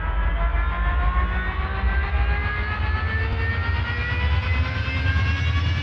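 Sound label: engine accel TIE interceptor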